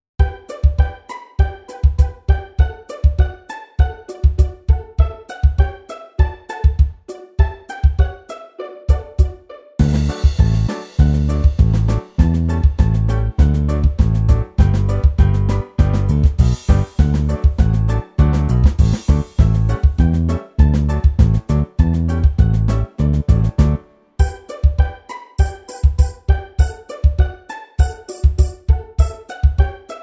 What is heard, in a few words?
Karaoke